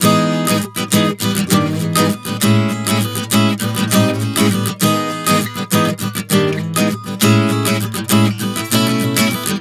Emaj7-Amaj7-B11 100 bpm, acoustic guitar chord progressions